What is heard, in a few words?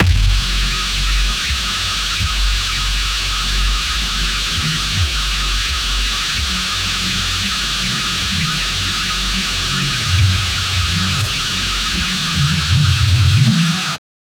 anime attack super power